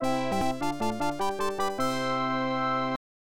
Perfect Fanfare; Chiki Chiki Boys; Sega; Megadrive-Genesis

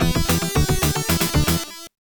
Lose; Championship Pro-Am; Sega; Megadrive-Genesis

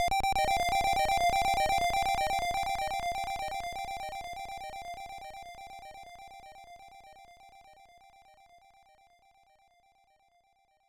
Power-Up Pellet; Decap Attack JP (Magical Hat no Buttobi Turbo! Daibouken); Sega; Megadrive-Genesis

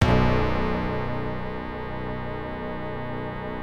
Gong; Fatal Fury 2; Sega; Megadrive-Genesis

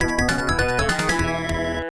Intermission, Arcade Bonus Fail; Bonanza Brothers; Sega; Megadrive-Genesis

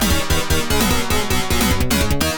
Level Clear; Decap Attack (Magical Hat no Buttobi Turbo! Daibouken); Sega; Megadrive-Genesis

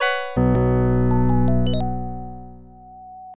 Item Get Jingle; Shining Force; Sega; Megadrive-Genesis

